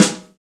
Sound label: PRC, Rap FM Perc, Roland; Rappin Perc